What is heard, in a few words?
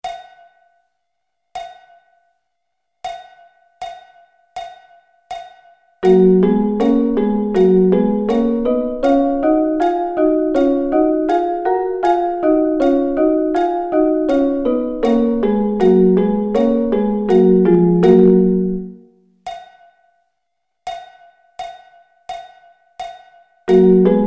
Double Stops